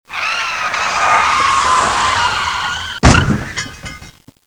Voiture crashée

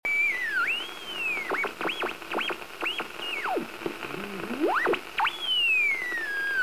Tuner; bruitage; Electro-menager

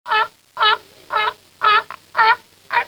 Phoque, Animaux; bruitage

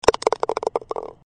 Golf balle ds le trou

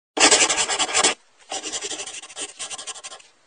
Gribouillage

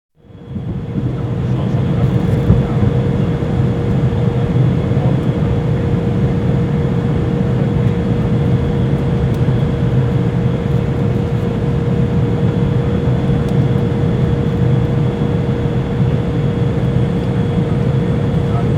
Intérieur avion

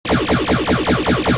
Tir pistolet laser

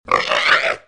Cochon, Animaux; bruitage

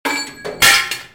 Bruitage sur etabli; Outillages

